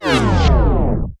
Tape Stop

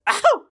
death hit; Collector; Hollow Knight

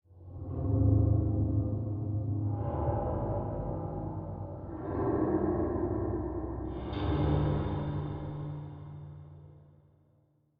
music - mystery - ambient mood; You Are Empty

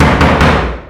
Loud Door Bang

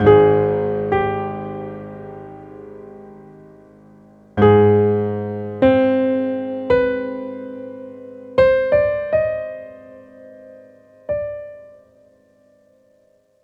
Theme Piano; The Darkness 2 gamerip 2012

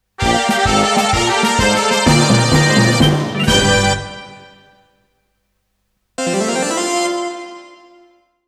Fanfare; Celebrity of the Voice; Voice Bowl

